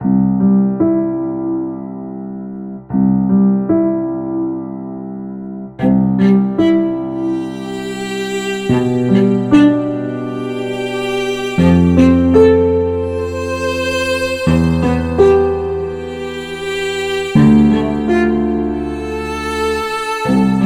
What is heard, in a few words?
Equilibrium I Cello version; West Cortez Records